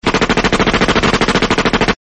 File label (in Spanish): efectos de sonido disparos metralletas